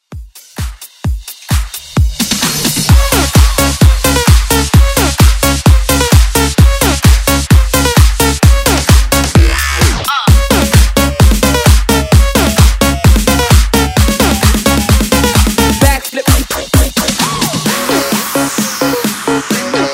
Dance Redrum

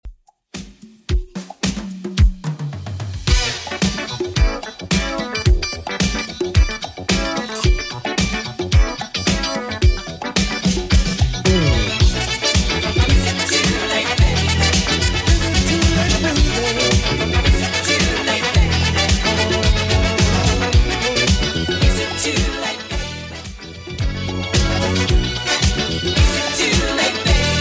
80s Redrum 110bpm; remixplanet

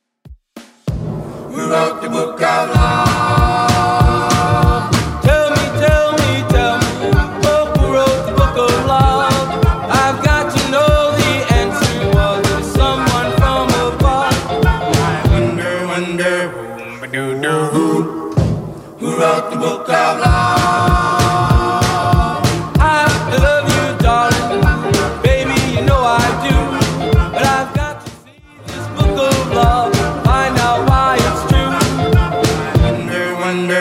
50s Redrum 192 bpm

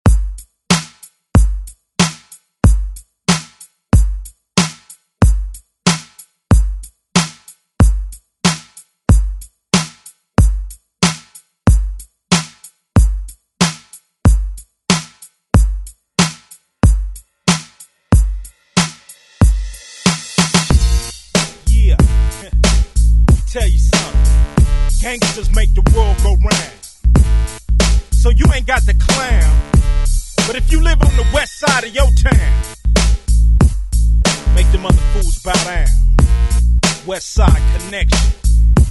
90s ReDrum; DJ Mhark

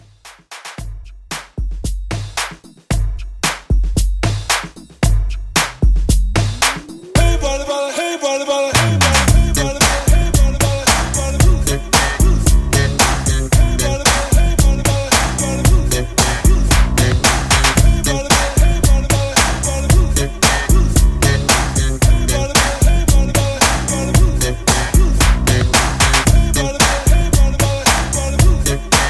90s RB Redrum 113 Bpm Clean

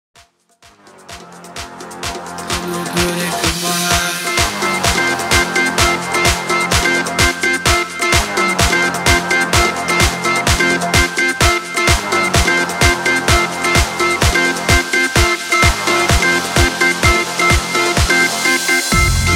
BOMB A DROP MASHUP 128 BPM

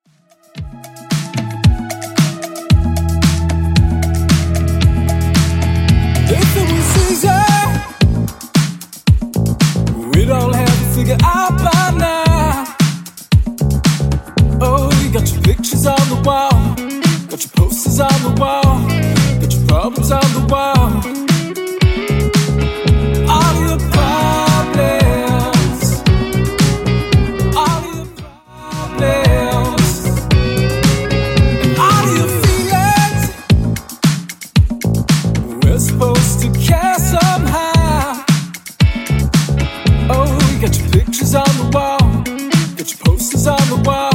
113bpm

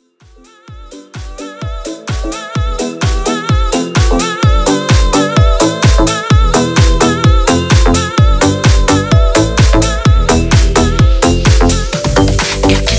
House; Mixshow Edit

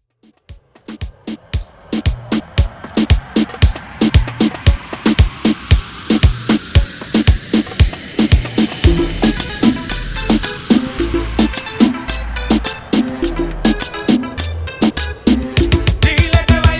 Transition 115 94

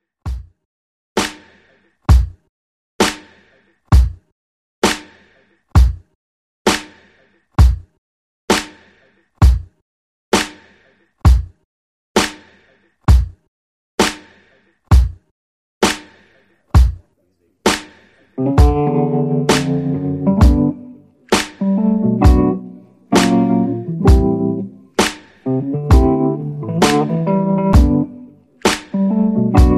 65.5 BPM

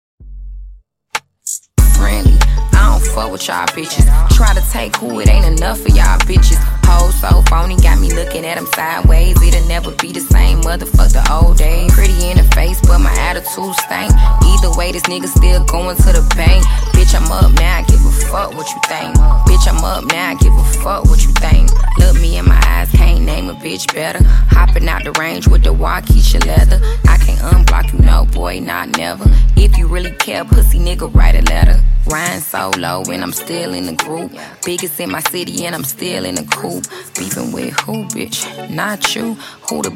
Dj Intro Outro Edit 95Bpm

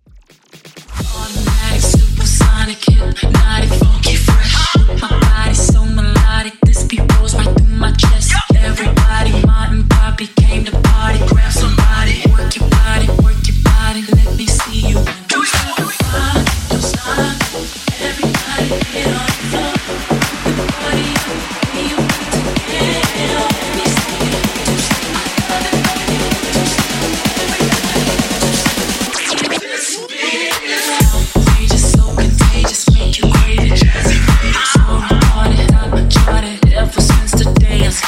2 Step Funk Wordplay Segue 128 106 Bpm

